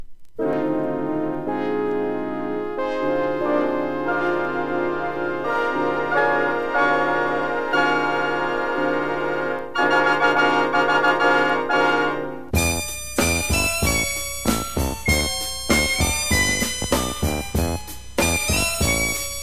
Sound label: moog machine; Columbia (us) /LP; ムビア企画の60sムーグ〜ロック・アルバム。